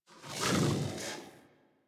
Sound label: Armored Vocal Breath Walk; Enemies; Kubrow; Warframe